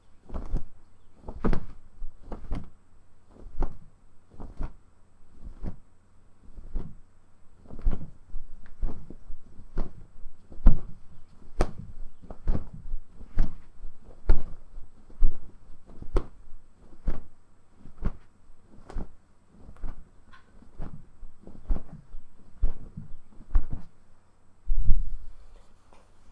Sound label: Flapping Cloth wavering